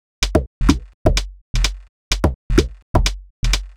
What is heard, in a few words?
Top A 127bpm, House Drumloops; Zero-G House Fabrik Drumloops; ZG Drumloops